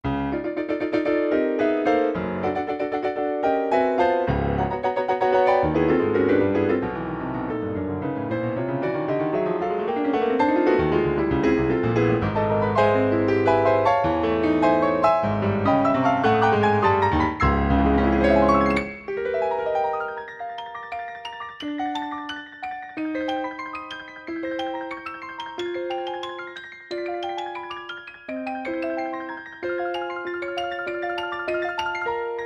Kurzweil ME-1

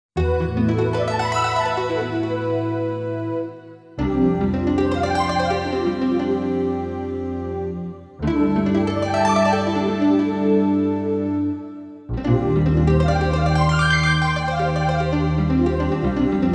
harp

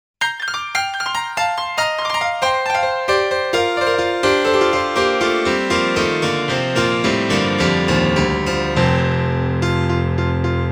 pianos

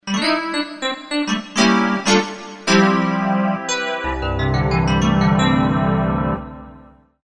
DX piano

